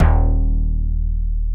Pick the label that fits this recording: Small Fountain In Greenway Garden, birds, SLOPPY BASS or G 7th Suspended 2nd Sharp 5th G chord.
SLOPPY BASS